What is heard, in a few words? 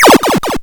Hard PCM Chip; chiptune